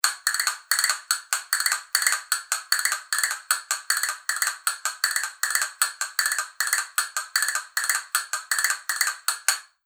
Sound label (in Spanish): castañuelas granadillo consol